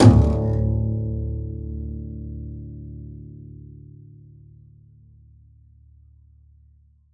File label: pizz noise super d, double bass